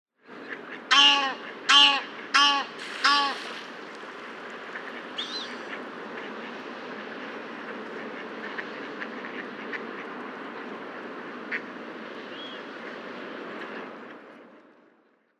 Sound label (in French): ouette d égypte